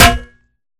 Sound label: Imp Bullet Light Ballistic Solid Metal